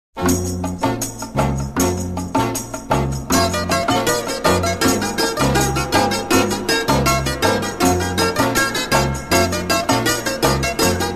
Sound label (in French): Kalamatiano, Grece